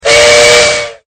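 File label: train whistle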